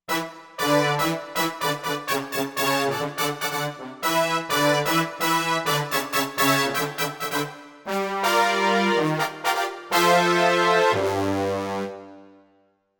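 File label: Brass Section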